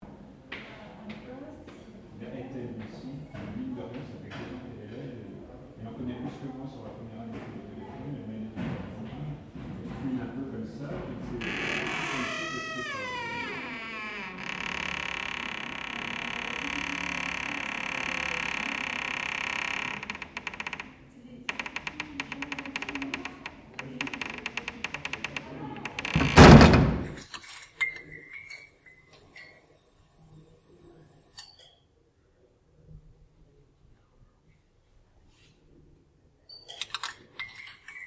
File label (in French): la salle de classe; winterschool